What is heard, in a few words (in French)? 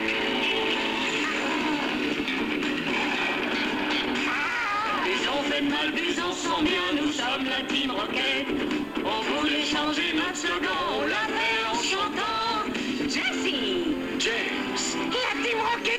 Motto chant matou; Jessie et James; Miouss; Miaouss; Persian; Hollywood